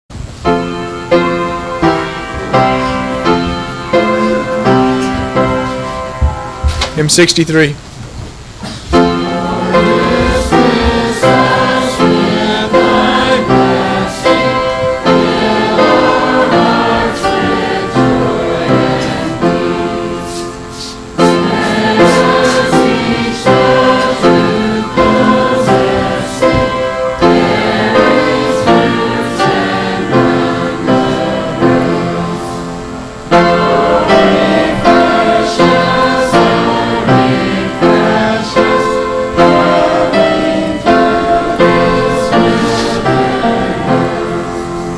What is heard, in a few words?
Hymn; Hymn 63